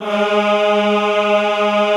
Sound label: EHH G#2, Classical Choir; Best Service